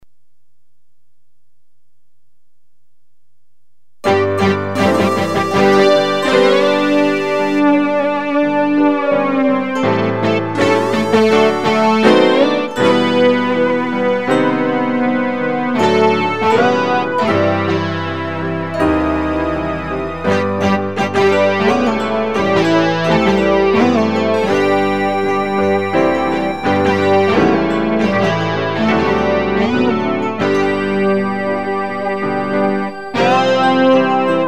DGX Synth

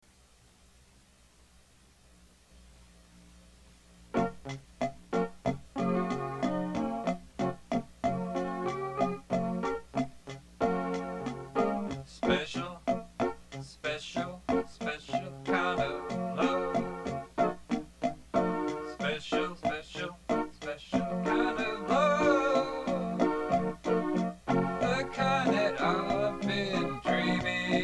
chorus

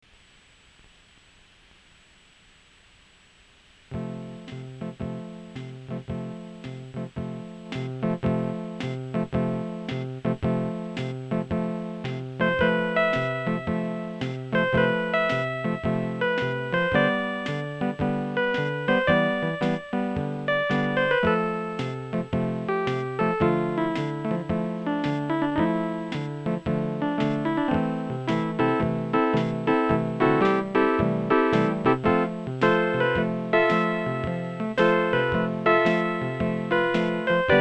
trite instrumental

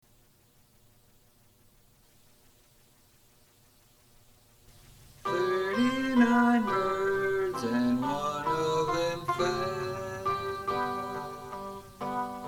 birds full chorus

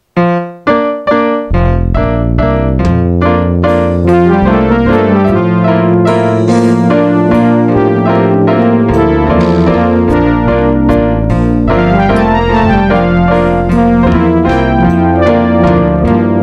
Waltz brass

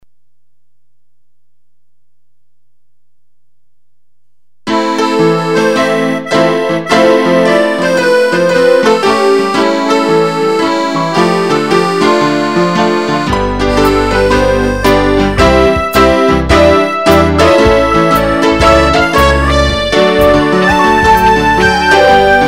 DGX with Drums

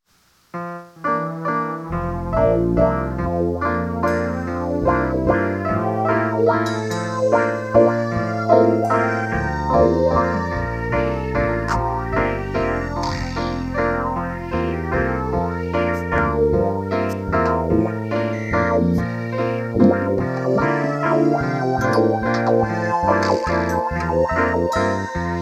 computer variations

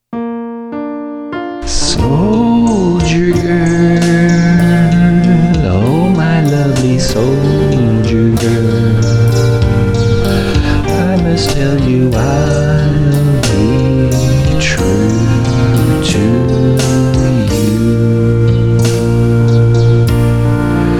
inst sing

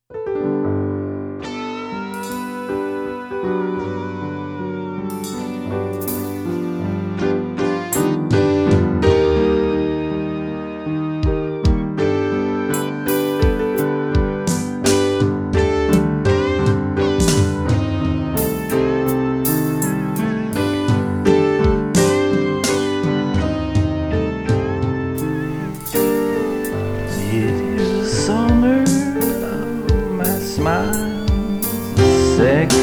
the rain song sing

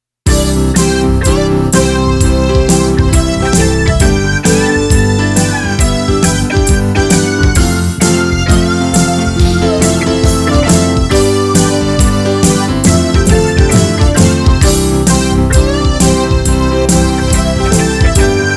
inst

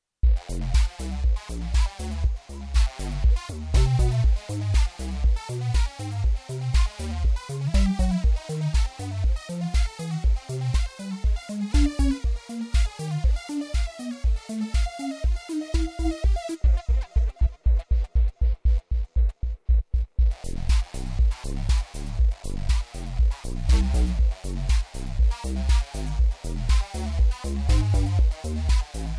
juno g internal patch autotrance

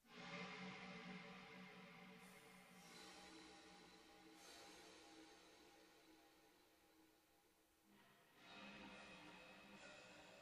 horror chorus cavern of rattlers; juno g

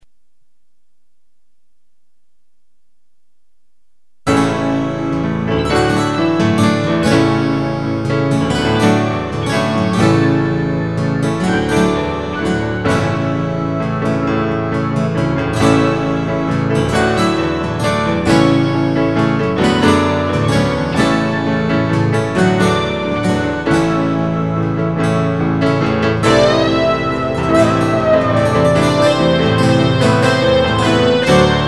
Guitar Wild

